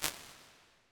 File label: Medium Choir, impulse responses, warped reverb; Small Spaces; Apple